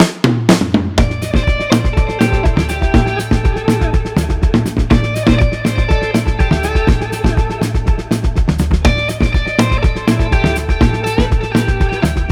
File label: blues; by burak sound bank; blues vol 1